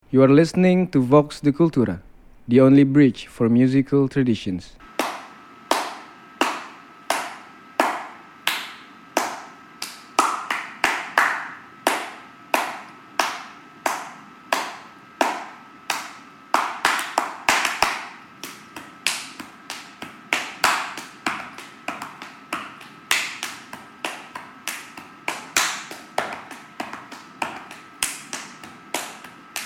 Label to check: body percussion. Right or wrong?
Right